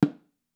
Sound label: SNARES Muffled Snare 303; Audiowanderer; Gimme SNARES Sample Pack